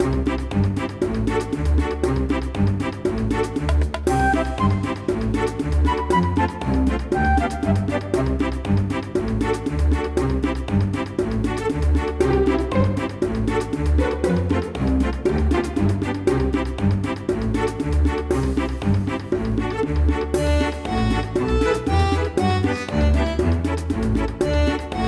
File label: Bgm - Menu Music